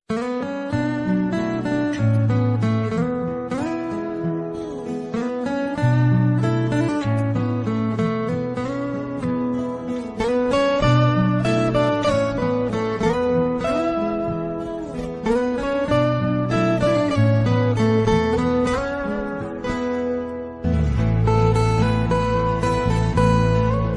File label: bgm ringtone love bgm in tamil